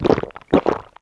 drink potion

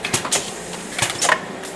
machine loop

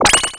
shield spell

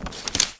equip item

